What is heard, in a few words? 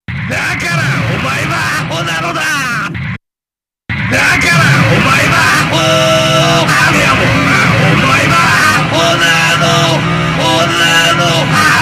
v-synth